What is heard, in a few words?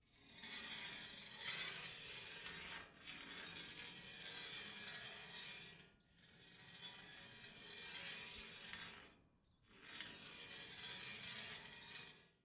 Metal Scraping